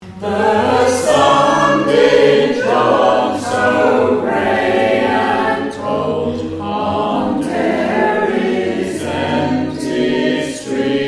Derry Streets All

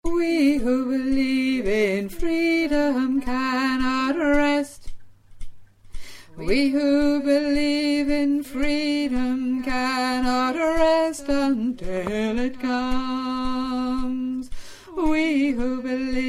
Ellas Song All

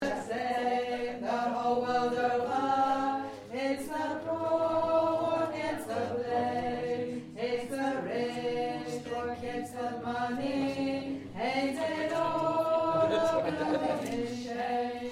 Alto

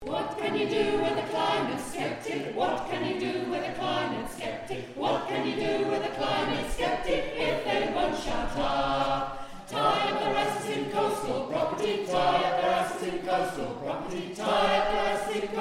climate skeptic

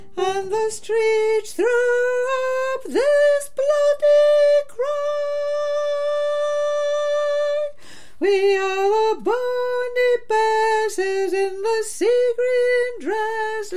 Chorus Sop

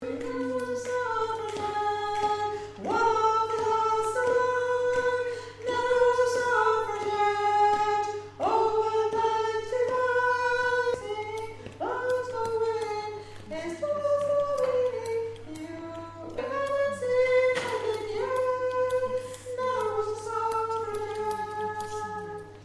Chorus Sop

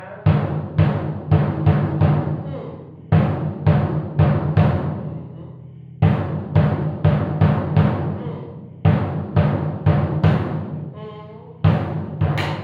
samba Low Bass Rt Hand No Rims

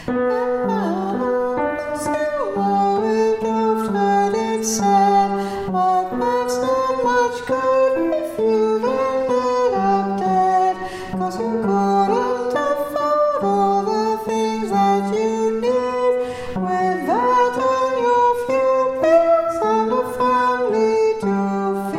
Tenor; Verse 3

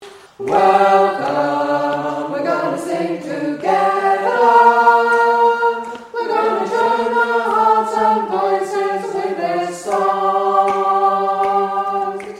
Welcome We're Gonna Sing Together Top

